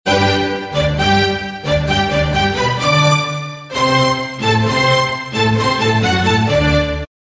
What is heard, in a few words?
nachtmuzik allegro orchestra